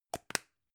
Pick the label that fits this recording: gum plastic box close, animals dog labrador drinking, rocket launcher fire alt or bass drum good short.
gum plastic box close